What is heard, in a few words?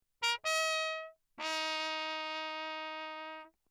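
trumpet mute, Crown; CrownAlu; Perinétventiltrompete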